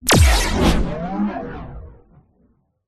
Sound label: science fiction weapon gun shoot